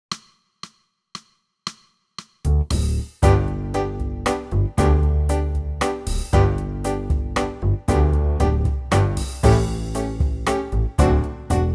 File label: Rhythm Session; red hot recorder tutor